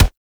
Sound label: Weapon Punch Rifle; Warframe; Weapons; Rifles